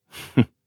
hurt; mortis; yangjian

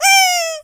die

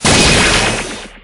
hit

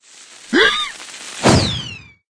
Emote Rocket

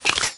skele warrior step

